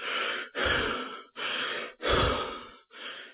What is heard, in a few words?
exhausted breathing; player